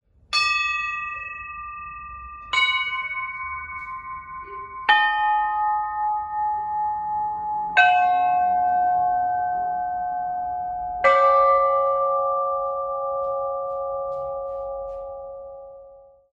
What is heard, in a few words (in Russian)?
5 kolokolov; Algashi